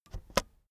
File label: keyboard external space press slow soft